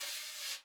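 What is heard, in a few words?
brush single; Northstar - New Gold VOL-2; 50S BRUSH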